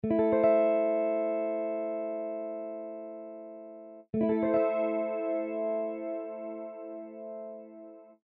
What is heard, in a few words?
Chorus